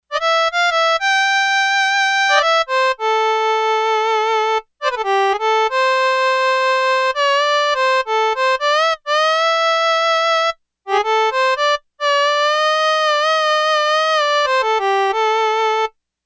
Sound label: Harmonica, Yamaha DX11; Factory Preset Voices